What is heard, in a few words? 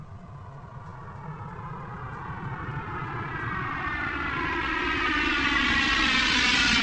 ROLL